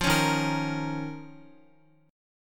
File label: Eb 7th Suspended 2nd, Guitar, chord; x,x,5,6,3,6; Eb7sus2 strummed; JGuitar